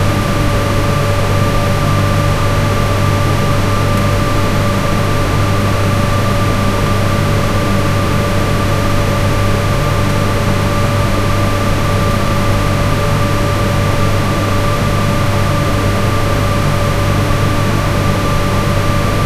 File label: air ventilation noise